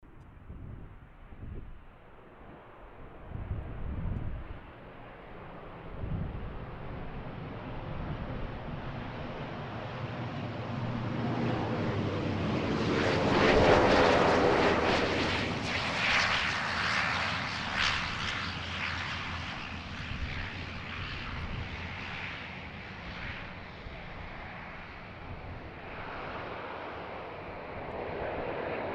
Airbus A320 aircraft taking off from the runway

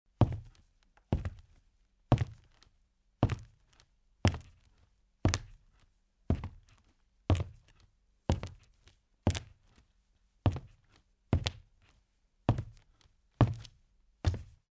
Mens steps in shoes

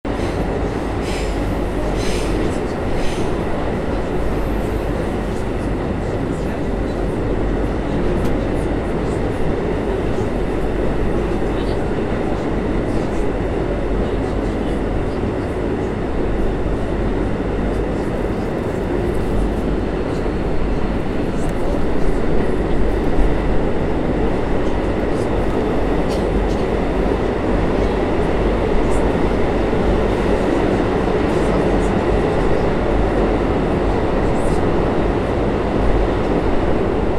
Subway train

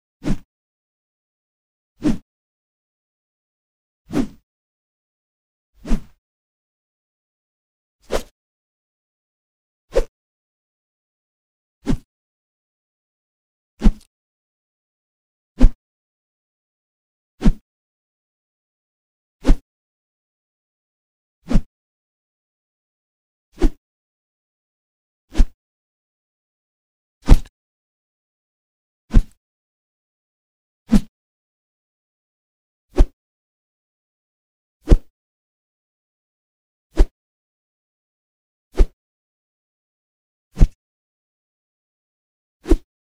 Air Whistle

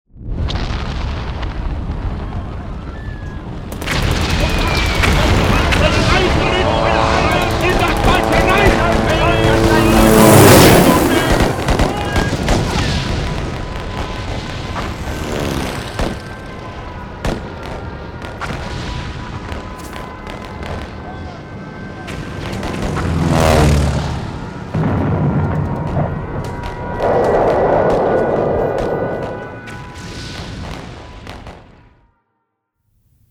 Battle on the war

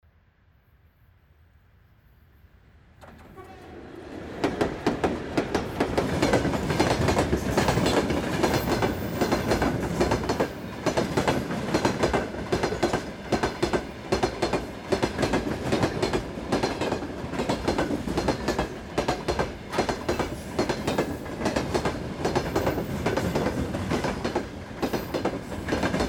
Train

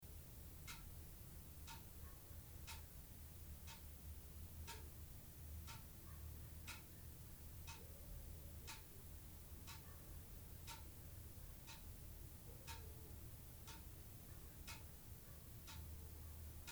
Room with Clock

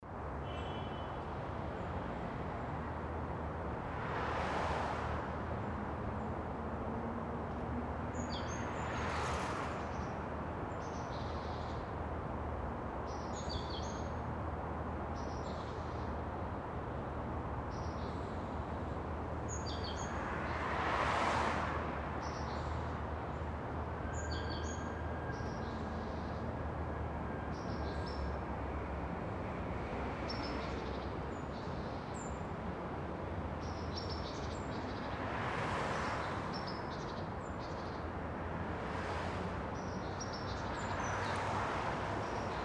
Background City Traffic

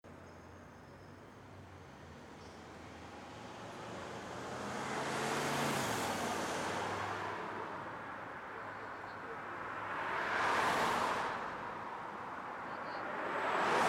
City traffic

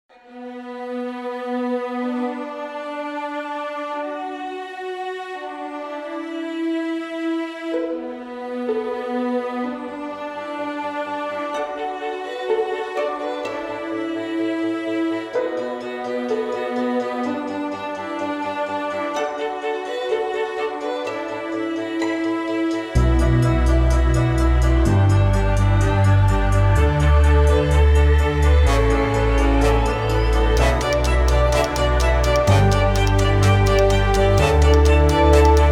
Epic trailer

